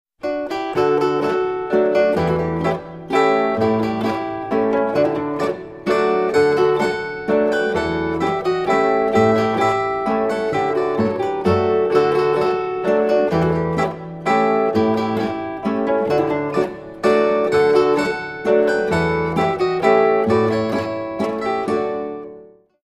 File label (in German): Spielmusik Zitherduo Walzermelodien